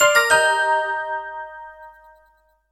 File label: alert